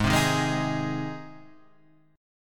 Ab Major Ab down Guitar Half Step Down

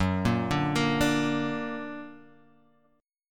F sharp Augmented, down; arpeggiated; JGuitar

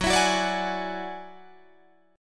Gb 7th Suspended 2nd Sharp 5th, Guitar, Drop D

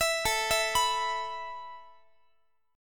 A Suspended 2nd A Guitar, chord; Asus2; arpeggiated; 4A,4E,5A,5D,5G#,6C#; JGuitar

